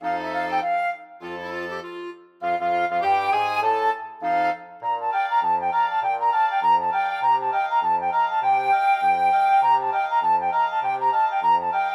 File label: rag, flexible mixed ensemble 4 players